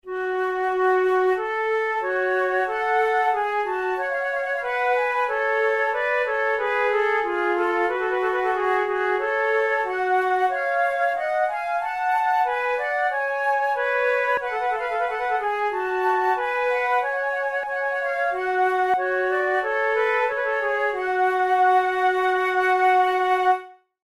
prelude, flute

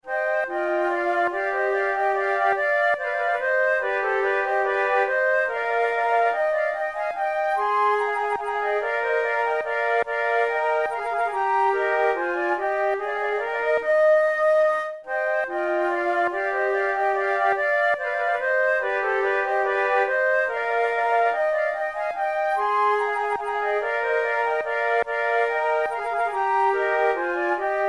flute sonata menuet; mattheson

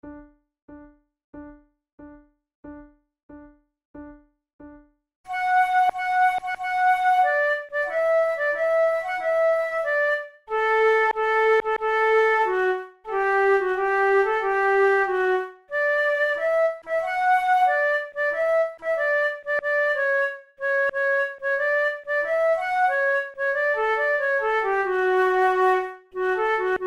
duet, flute tunes; Paisiello; Whither my love

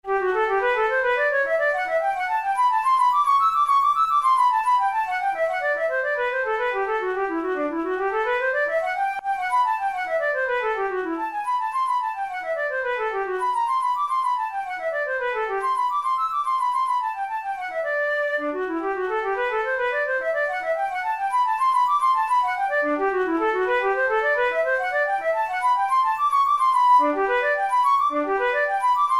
methode pour la flute study; drouet

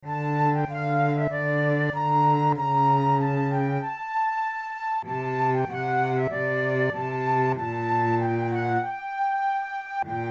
adagio, flute

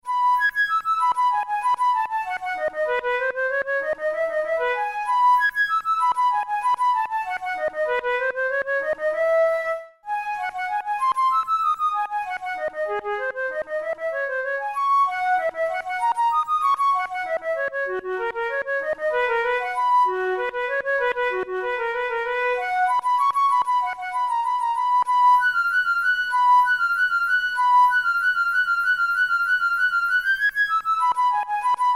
study in e major, flute tunes; kohler op33 no1 study no15

